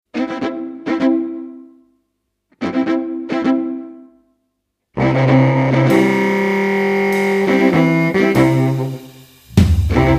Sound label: Lead; 25 Char Lead